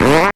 fart short

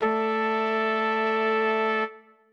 Horns

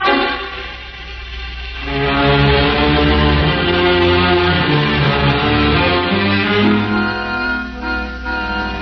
suspense

